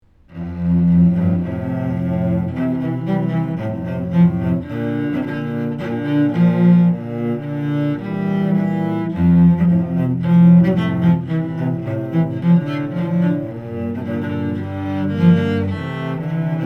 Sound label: Quartet